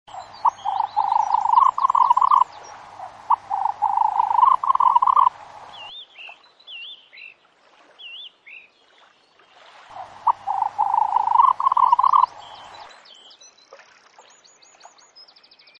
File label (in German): birkhuhn